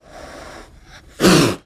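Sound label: Ocelot Hiss Curt Sneeze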